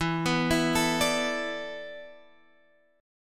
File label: E 7th Suspended 4th; E7sus4; arpeggiated; 12,14,14,14,15,x